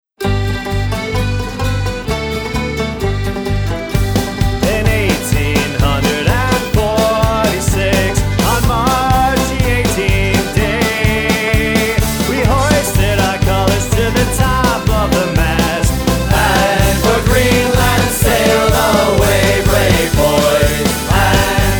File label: Irish Band; Chicago